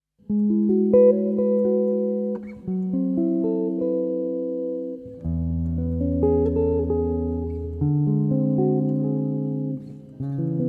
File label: Sologuitar Intro